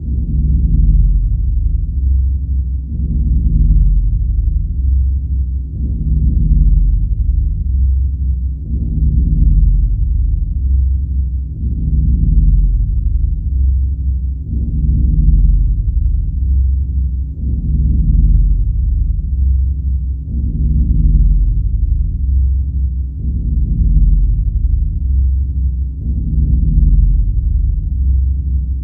ambience energy

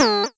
doot

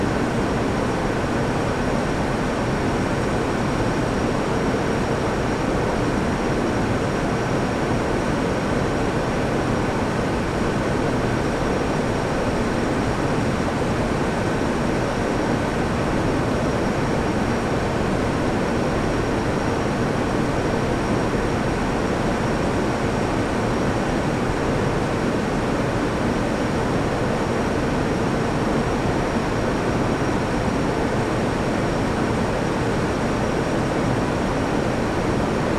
ambience

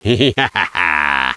laugh

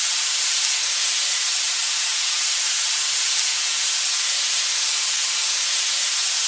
ambience disaster hiss

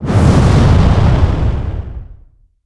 fire flare up

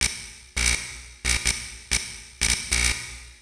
broker

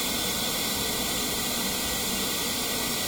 ambience pipes

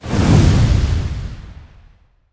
woosh

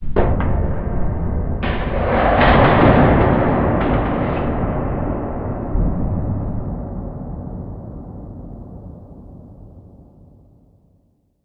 big hit far

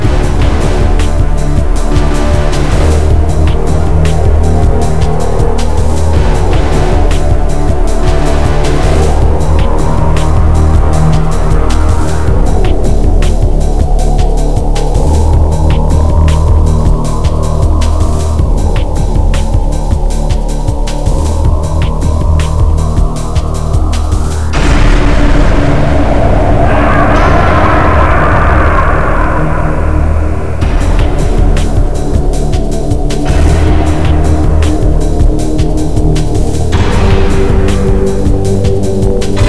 arena music

